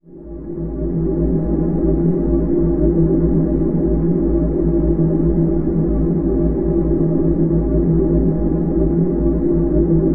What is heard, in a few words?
temple drone A, ancient drones; Zero-G Seismic Frequencies; 90 s Sample CDs